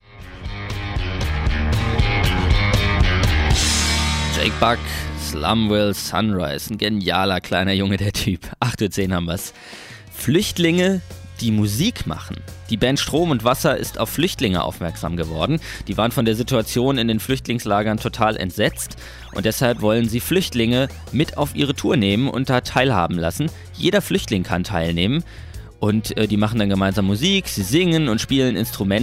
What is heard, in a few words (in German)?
Flüchtlinge machen Musik